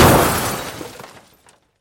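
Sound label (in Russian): короткий отчетливый звук разбившейся посуды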